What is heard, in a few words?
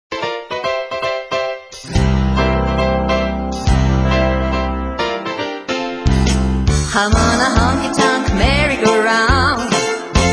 honkytonk